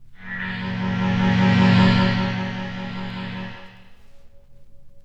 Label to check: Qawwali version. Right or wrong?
Wrong